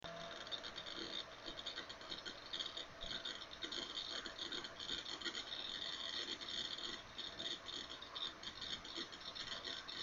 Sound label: HDD grinding